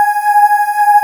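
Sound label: Synth Vox C4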